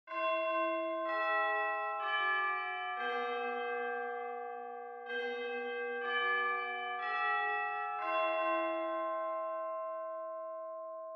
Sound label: Japanese School Bell Far Slow Mid